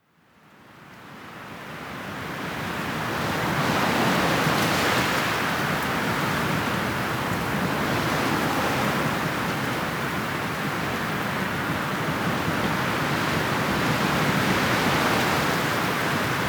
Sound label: wind mountains; best service Blue Box